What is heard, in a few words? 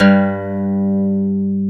G-1 ON, MINI 12.GTR; AKAI S-Series CD-ROM Sound Library VOL-7